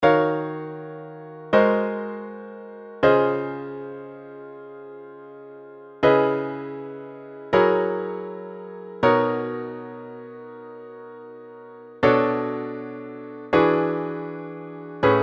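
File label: exercise whole tone; jazz chord progressions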